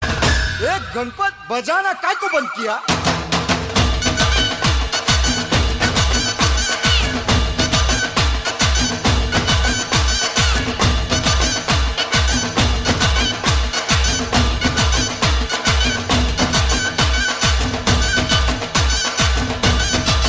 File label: dance music ringtone for mobiles